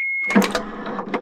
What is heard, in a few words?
bolts up; Machines